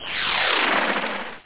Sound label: Bankrupt